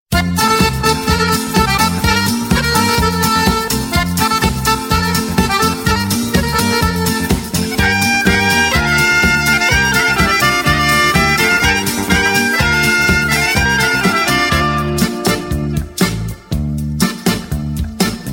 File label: Serbian Old Music